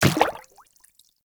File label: Inf Medium Run Water, Infested Footsteps; Enemies; Warframe